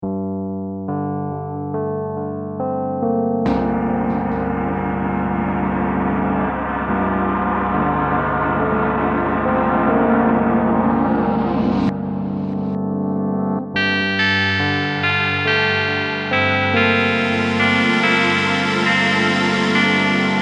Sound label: Creepy; gforce software